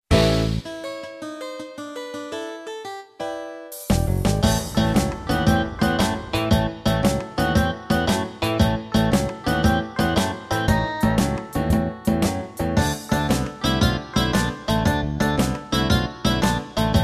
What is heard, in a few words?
blues